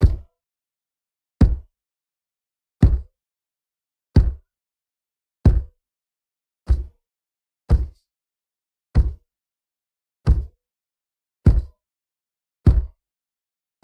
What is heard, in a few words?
hitting a wall; human; NS Studios - ultra sound collection